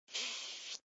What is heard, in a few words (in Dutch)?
blaas; The Netherlands